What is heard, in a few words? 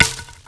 bush; Mafie 1